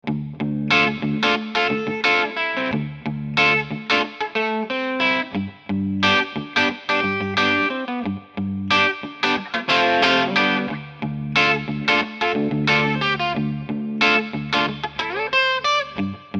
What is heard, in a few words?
HK TM36 little Crunch